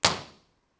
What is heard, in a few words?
Study ImpResp